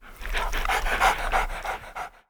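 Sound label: Kennel, selection; 0AD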